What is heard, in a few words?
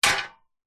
industrial sack truck trolley metal impact concrete